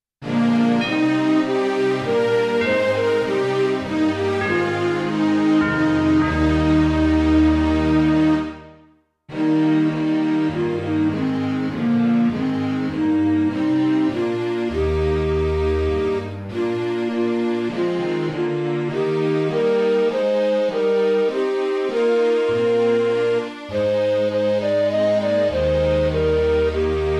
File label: midi